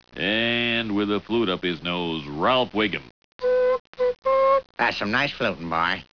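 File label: FLUTING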